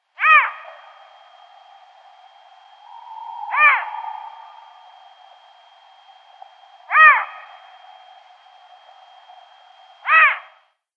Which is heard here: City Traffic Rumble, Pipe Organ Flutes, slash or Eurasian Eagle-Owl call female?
Eurasian Eagle-Owl call female